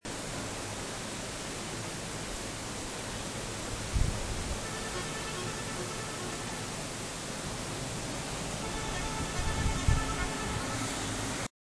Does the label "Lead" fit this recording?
No